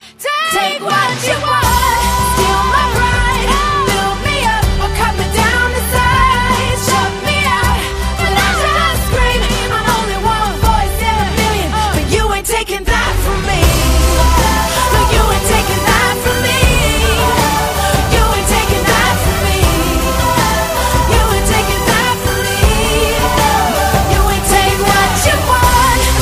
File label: Ringtone